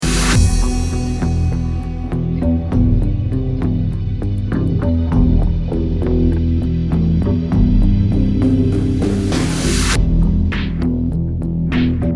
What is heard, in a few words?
Old Main Menu; Fast and Furious Legacy; mobile